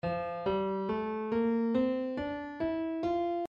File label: F M scale